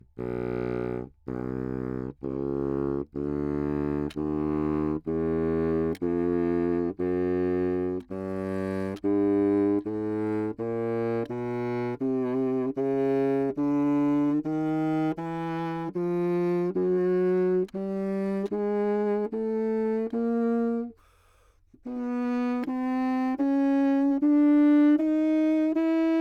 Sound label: chrom, baroque bassoon, Anechoic; OPENAIR